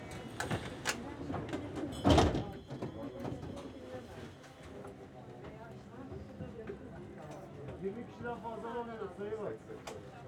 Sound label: Interior, Underground; City Sounds